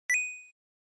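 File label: Game Boy Action Item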